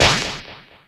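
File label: Fx snare drum, Roland MC-303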